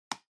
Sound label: PC Mouse Toggle